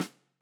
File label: Snr, drum